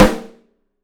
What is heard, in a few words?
MUTD BRASS; 3D Drums